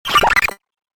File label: Mr Robot Frenzy, robot sound effect; science fiction sound effect; Andrew Fleming